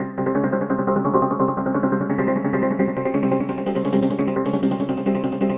172 speedy-synth-percussion, synth and electronic loops